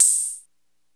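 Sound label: 909 open hi-hat; E-MU Formula 4000 Series Vol. 2; OrbitPercMaster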